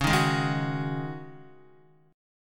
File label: Db Suspended 2nd Suspended 4th Db, Guitar; strummed; JGuitar